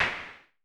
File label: SN Low Punch, Vermona Mono15 Drums Snare